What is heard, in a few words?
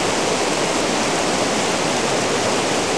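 spray loop; Splashdown; XBOX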